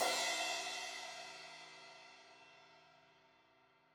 Crash 16in edge, Cymbals; Deeply Sampled Drums

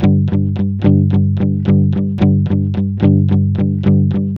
ROCK G 110, Vintage Blues Guitar; USB Soundscan vol.22; AKAI

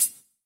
HH, Drum Mashines; EdgeSounds

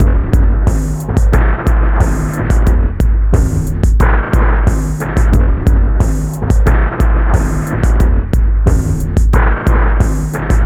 Darkness Loop; Sonic Foundry (Sony Creative Software); Esoterik Beatz

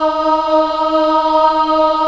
D#3 CHILD AH L, Choir; Acoustic & Synth; CHILD AHP; AKAI; USB Soundscan vol.28

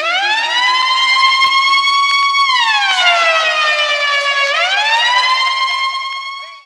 alarm, FX & ATMO, ALARMS & ALERTS

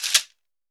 CABASA; AKAI S-Series CD-ROM Sound Library VOL-5

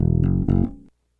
bass, Funk 131 F#min; Big Fish Audio; Hardcore Breakbeat Action; Funk Factory

